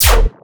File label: bass hit chugg stab D, Bass Stabs; Virtual Riot